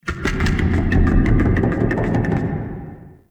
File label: Distant Clicking; Ambience; Warframe; Duviri; Murmur